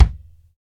subtle reverb kick drum single hit a key, kickdrum sound tuned to the A note